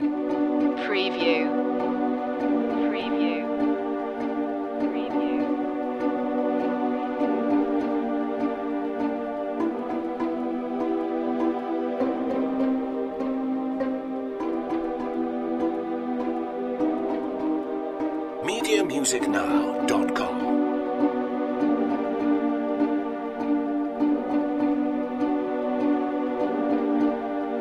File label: loop, A hybrid orchestr; witches